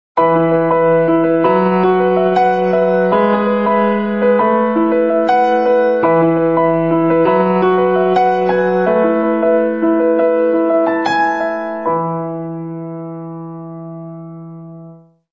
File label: summer day piano; iPhone